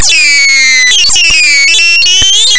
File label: computer